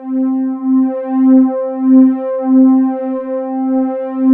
JUPITER 8, ROLAND; Rubber Chicken Software Sample Bank

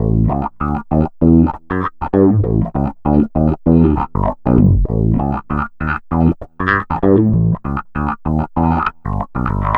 Bit Reduced Bass, Electric Bass; Sonic Foundry (Sony Creative Software); Leo Cavallo Bass Taster

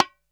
BONG HI SLP; CUBA

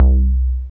BAS, Eurotech; Sounds Good

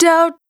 Spot, Single Voice Melodic Loops; Singles

